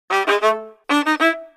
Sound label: violin effect short